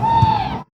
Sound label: Moody Park Playground Perc; Houston